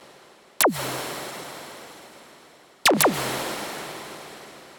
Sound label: 100 Perc Laser Blasts; Zero-G; Future Wave